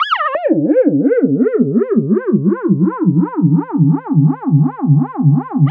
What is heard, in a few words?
Noise, LFO & Noise; Sonic Foundry (Sony Creative Software); Prototechno